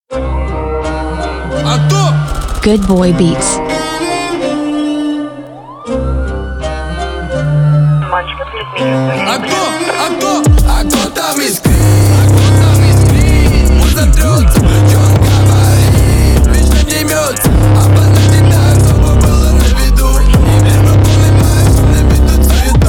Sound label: Beats, remix